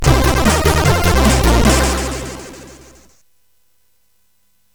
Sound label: Intro Jingle, CSG8580R5; sid